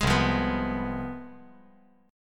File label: C Major 7th Suspended 4th Sharp 5th, down